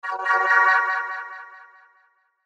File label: Musical Bright Delay Reverb; Brass; Blastwave; Sound Effects:Musical